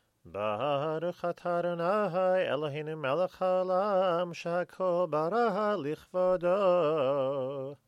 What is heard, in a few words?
wedding blessing